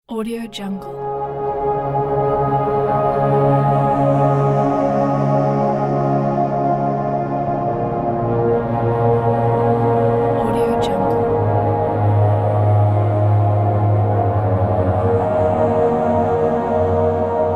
beautiful atmosphere

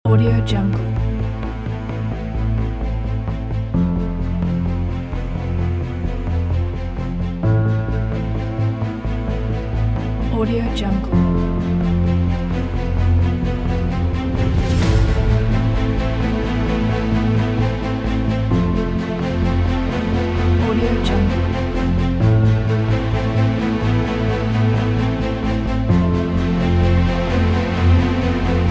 epic emotion dubstep

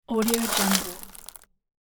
beans small short spill metal; cinematic sound design

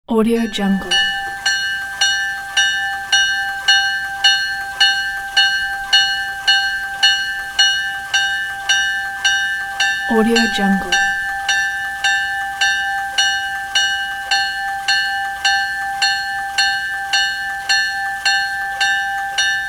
large diesel locomotive bell ringing; sound-ideas

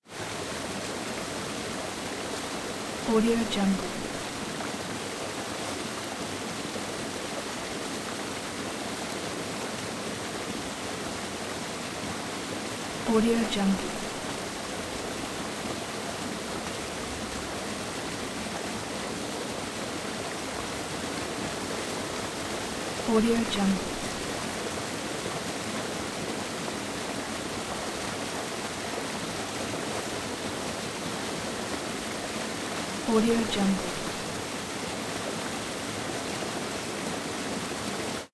waterfall small aqueduct into lake

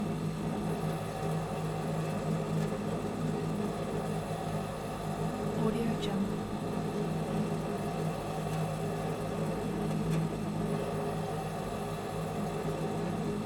air conditioning clunky external unit loop

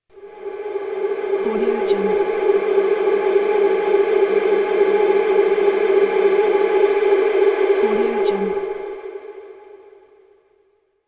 windy wave; electronplanet

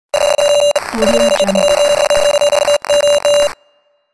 morse code

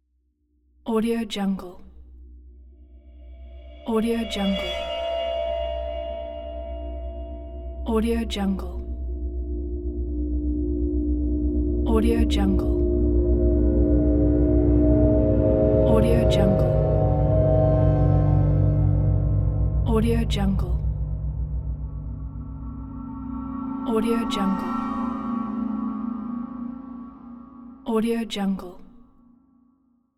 horror metalic swell

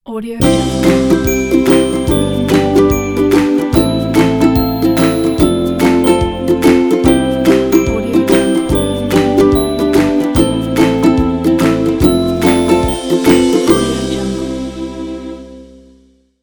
kids logo

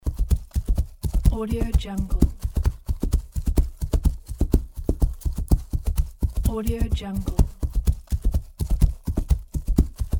horse galloping on grass

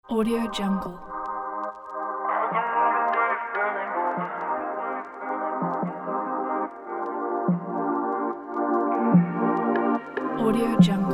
background rnb trap hiphop beat

